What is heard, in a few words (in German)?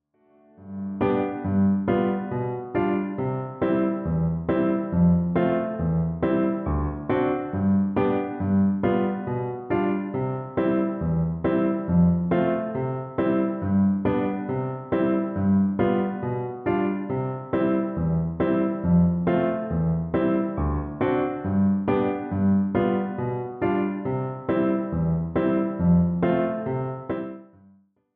gavotte c dur